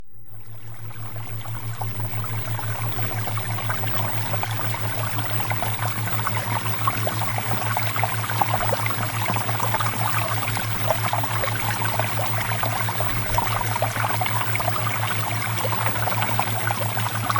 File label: Water Theta Waves; Premium Subliminals